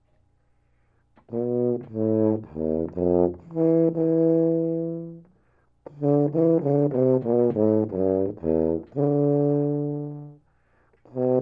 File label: tuba; ff4